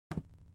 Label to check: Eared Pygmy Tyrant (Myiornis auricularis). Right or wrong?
Wrong